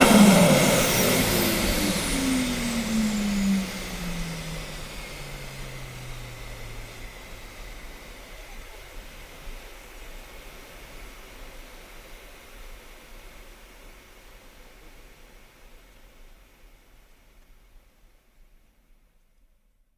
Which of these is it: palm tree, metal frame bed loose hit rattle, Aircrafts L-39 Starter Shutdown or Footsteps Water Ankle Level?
Aircrafts L-39 Starter Shutdown